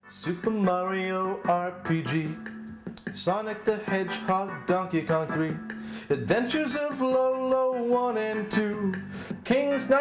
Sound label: shop bgm loop; WiiMart